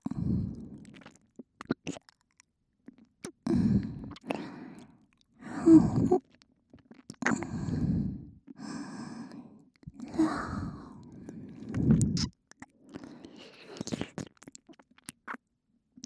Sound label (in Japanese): 耳舐め 右; 『濡れ姉妹女将』-癒し女将音声- 耳舐め 耳かき ハイレゾ